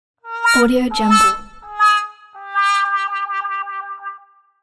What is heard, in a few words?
failure trumpet; henninghansen